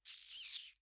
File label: rat; mobs